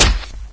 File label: foot light, antlion guard; Source Engine; video game; npc